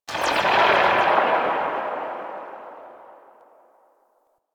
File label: Sniper Rifle Tail; Female; Wraith